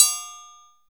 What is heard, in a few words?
REALTRI OP, TRIANGLES